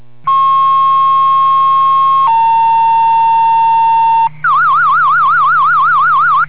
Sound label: EMS Dispatch Tones